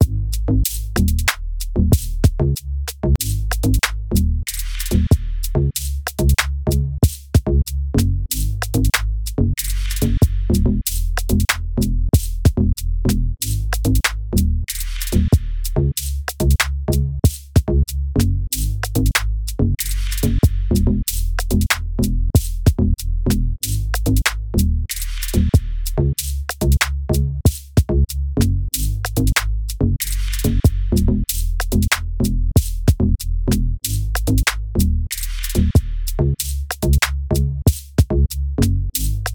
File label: music beat